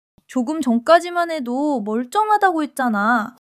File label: laughter